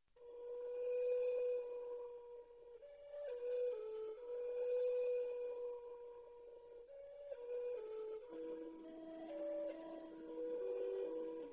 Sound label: Vocalise for Two Tenor Recorders